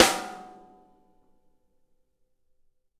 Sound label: SNARE; drum